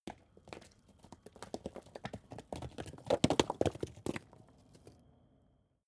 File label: stone medium fall rolling